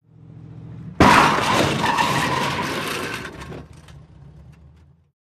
Drop Impact Moving Vehicle Collides With Stationary Vehicle, Centered, Great Tire Squeal